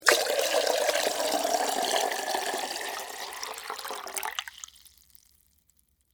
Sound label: Water Pour Small Pot, Into, Metal, Antique, Long; Pouring Sound Effects; BlastWave